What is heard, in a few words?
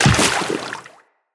Run Water, Footsteps; Tenno